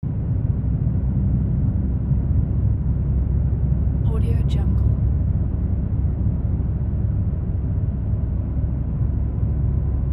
inside a car; tineside

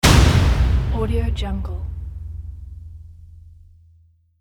epic drum hit

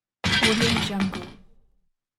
metal object drop

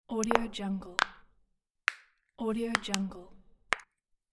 a bone crunch; dropstudiomarket

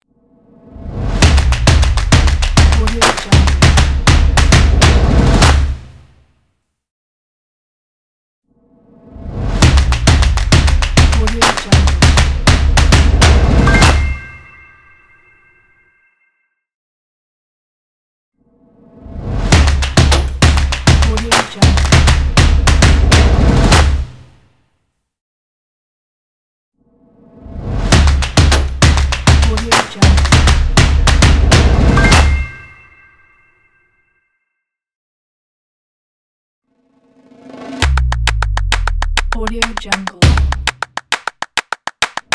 stomp and claps logo

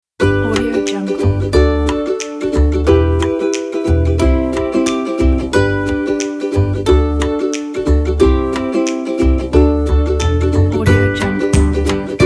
happy is

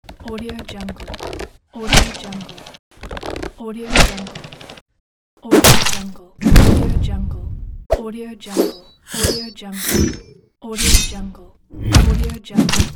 attack sounds pack

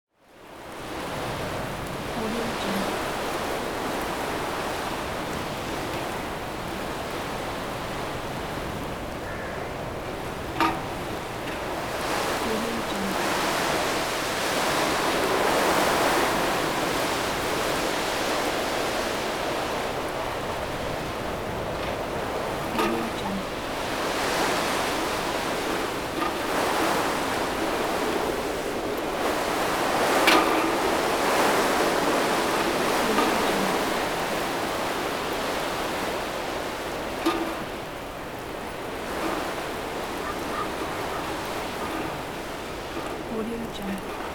strong wind in town

